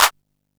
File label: Clap; AMG - Prototype Drum & Bass; Snares